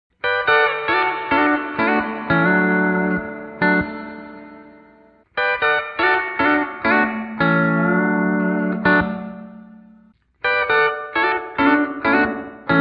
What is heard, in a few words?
Reverb; ToneX Pedal; Room Plate